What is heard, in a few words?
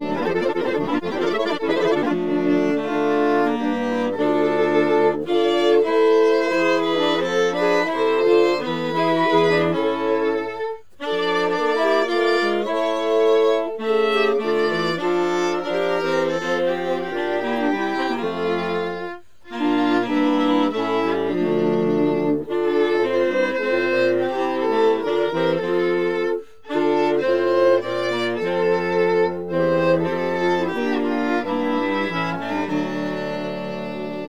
Bach Chorale resyn; scorealign